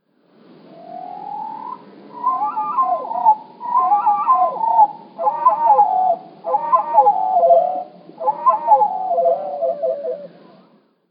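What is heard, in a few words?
orig fourth Speed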